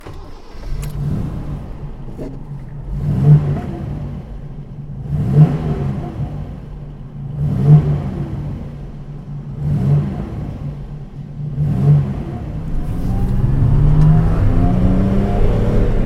Ford Capri 280

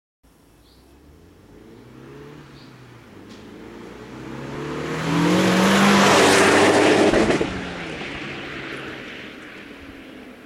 Megane 250RS Drive By

